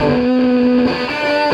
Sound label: Krush, Electric Guitar